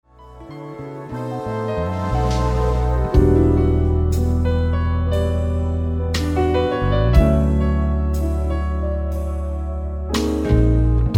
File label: Lullaby of Christmas; extract dissolvenza